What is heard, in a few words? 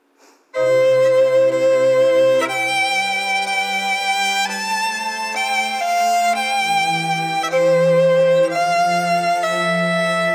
violin